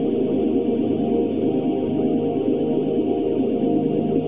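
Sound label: ambient choir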